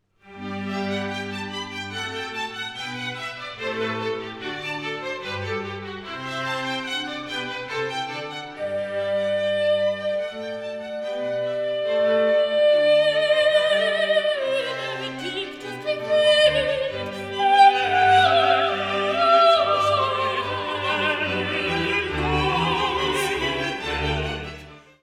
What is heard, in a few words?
Benedictus qui venit